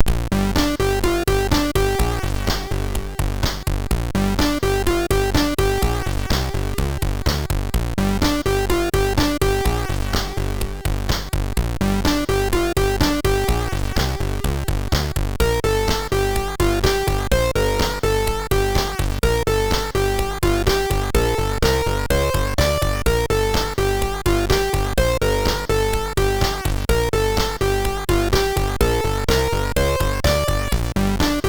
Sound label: Lights On, MOS6581R3